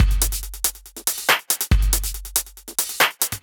Breakbeat; Vengeance Samples; VEC2 Loops

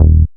Offbeat Bass D#; Vengeance Samples

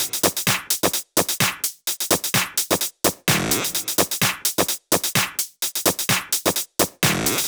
128BPM Air Raid Kit

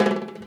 Bongo; Vengeance Samples; VEH1 Percussion

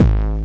BD Distortion, Bassdrums; Vengeance Samples

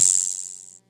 Open Hihats, Cymbals; Vengeance Samples

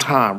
Vocal Oneshots; Vengeance Samples; Vocals

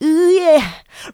vocal house adlibs; PS-28 Vocal House Samples

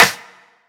Claps

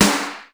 SNR, Classic drum machines; SR16; musicradar-synth-samples

